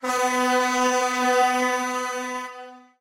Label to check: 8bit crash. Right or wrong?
Wrong